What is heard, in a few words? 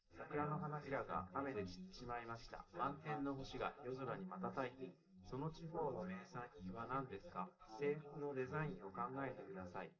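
male, live recording 250ms 1m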